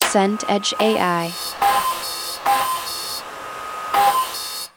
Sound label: scanner flatbed